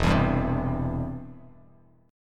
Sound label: E Minor Sharp 5th E down Bass Standard; Em#5 strummed; JGuitar